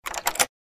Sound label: Metal door unlocking opening